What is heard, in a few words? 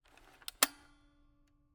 Camera, Kodak EK2 Instant, polaroid, large, plastic, springs, reset; Eiravaein Works; Vaeyan IV